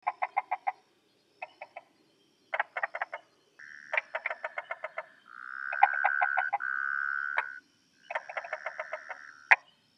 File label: Polypedates megacephalus, tree frog of Taiwan; 白頷樹蛙